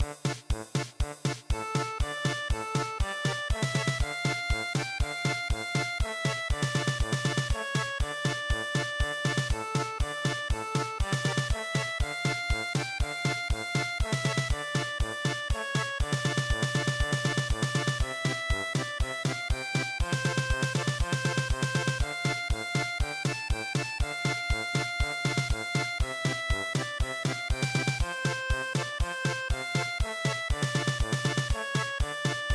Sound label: March, German Polka; Rupprecht March